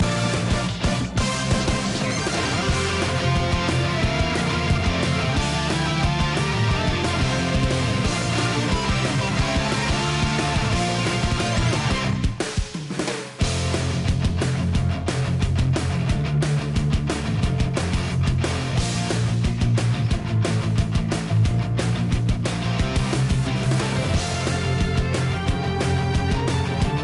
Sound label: inst short loop